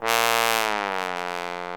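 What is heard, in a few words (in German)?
POSAUNE ABGANG; Best Service; Alpine Volksmusik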